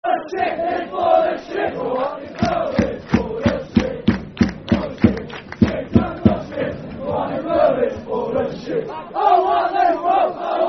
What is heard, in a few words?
Hartlepool Is Full Of Shit, Football Chant (Soccer Songs); Darlington Dfc